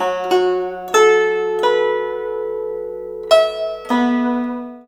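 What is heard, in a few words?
guzheng; Spectrasonics; Heart of Asia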